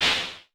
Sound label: CLAP, Vermona Mono15 Drums; Stereo layers; blend with snare